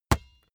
basketball single hand catch

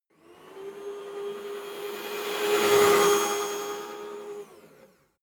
fly by cleaning robot passing

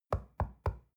stone wall knock soft

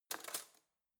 cutlery spoon drawer grab

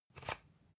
usb port metallic out soft

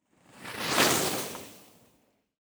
skiing

